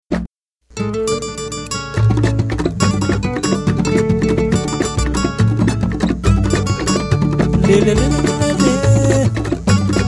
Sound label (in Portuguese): no toque do tambor